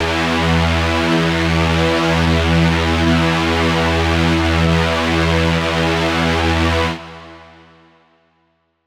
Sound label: Riddim Melodic Hit - E Intense; One-Shots; Ghosthack; Riddim Essentials Volume 2